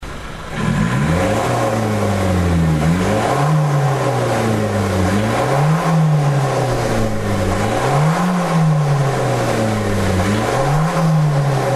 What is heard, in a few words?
Mazda CX3 DK SkyActiv Muffler Audio; AutoExe